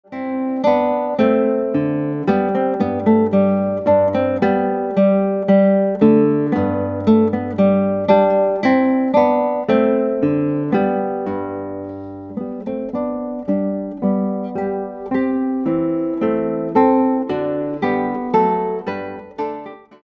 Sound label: Guitar